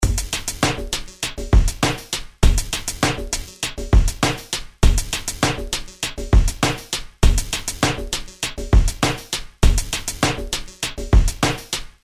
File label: rhythm industrial; Sound Graph